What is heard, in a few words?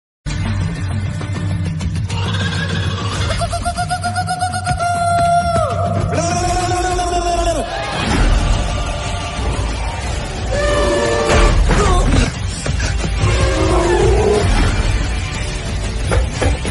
theme bgm ringtone Telugu bgm ringtone south Indian bgm; ranaduggubati